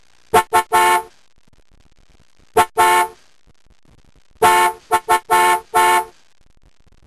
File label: car horns, cairo; egypt